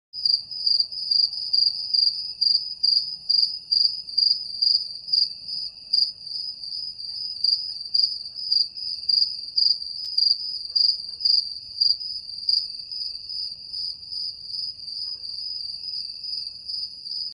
grille

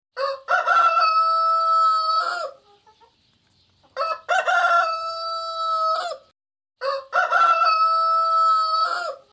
hahn

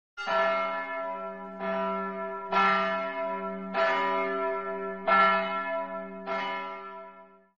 kirchenglocke

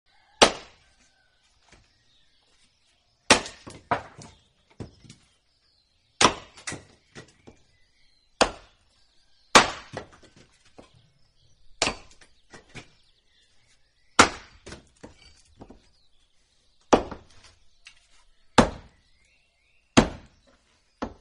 Holz spalten

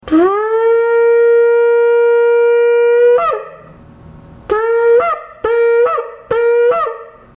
hornblasen

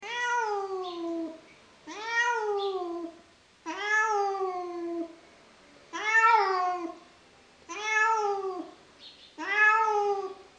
katze

